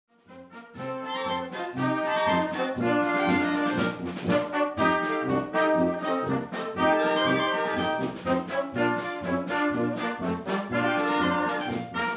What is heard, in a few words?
Polka